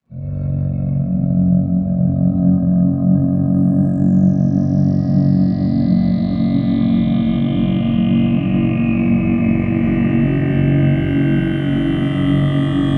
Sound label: drone